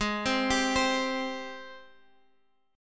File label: G sharp Major 7th, Guitar, Drop D; x,11,10,12,13,x